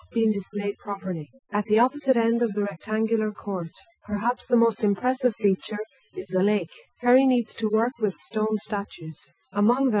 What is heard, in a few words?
female